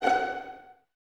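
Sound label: Violins F#5 pizzicato, String Ensembles; 23 Violins; Miroslav Vitous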